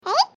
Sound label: monster unclick